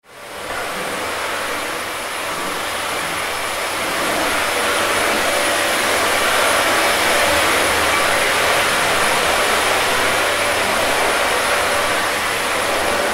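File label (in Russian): пылесос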